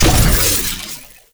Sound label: player death; captainbigbutt; carbon miku